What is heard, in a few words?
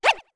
Shout